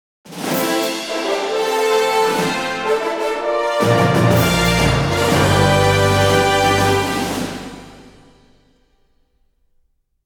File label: Roll Out Jingle; The Wonderful 101